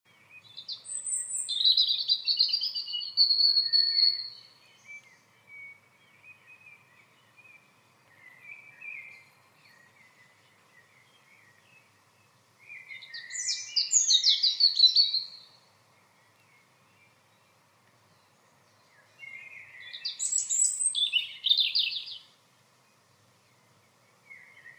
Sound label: Erithacus rubecula; SETE